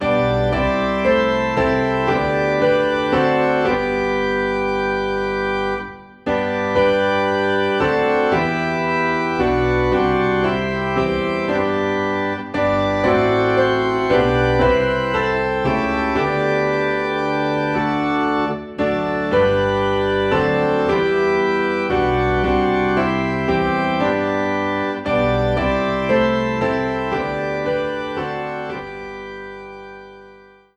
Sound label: Piano and Organ in G